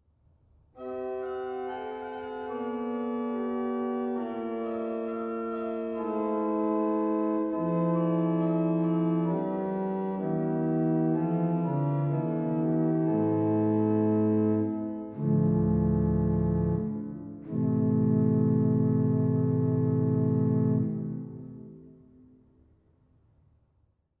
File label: Principal 8, organ; dortmund; Sauer